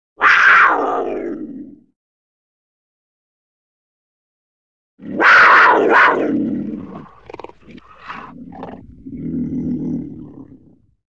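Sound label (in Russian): pantera golos, panther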